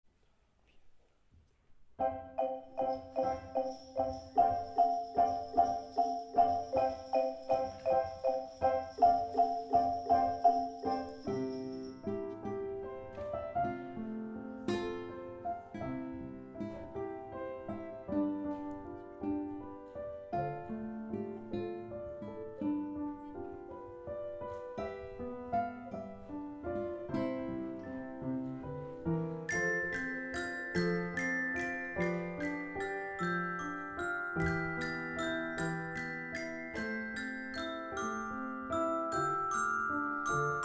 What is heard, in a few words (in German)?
mit Chor; Aschenbrödel